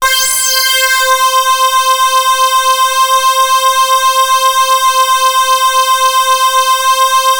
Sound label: C5, Korg MS-2000; Synthworx